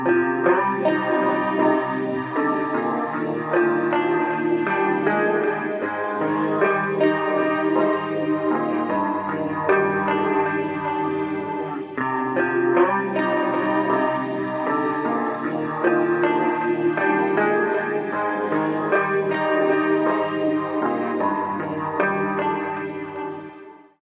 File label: Phaser Twisted Vibes Guitar Wet; Chromacycle; Syntheway